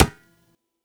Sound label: "kat", khol